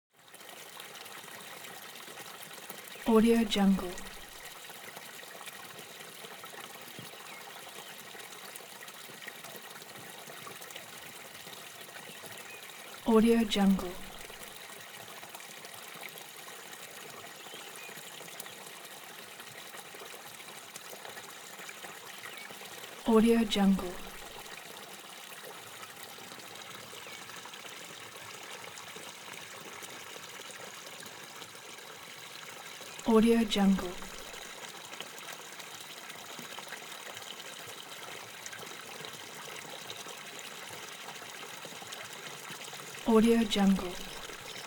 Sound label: atmo flowing waters river ripple close birds; smartsoundfx